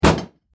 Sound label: Car Crash; teststrecke-game